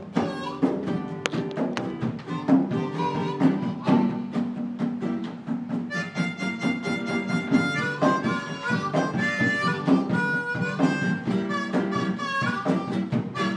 drums

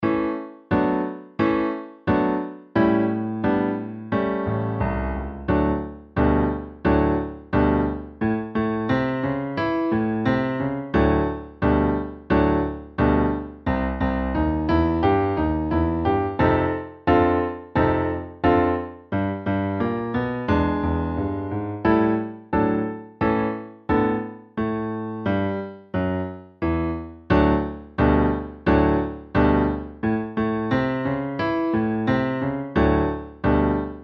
violins

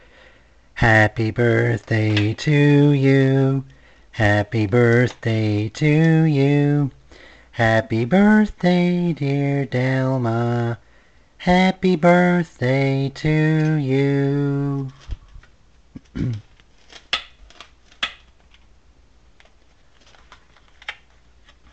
my husband sing happy birthday

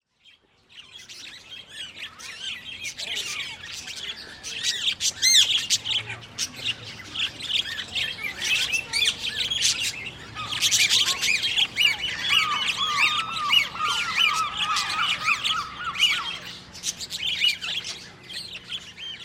ward park birds

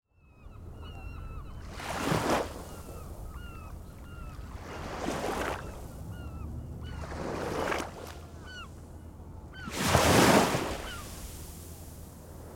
Whitby harbour gentle waves on the beach

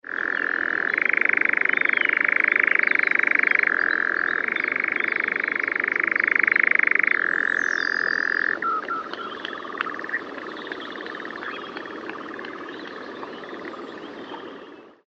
nightjar, Blaxhall Heath

